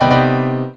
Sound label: ECHO